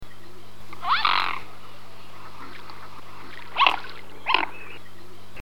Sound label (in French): cygne